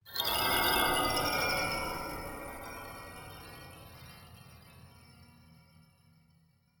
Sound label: Granular Glock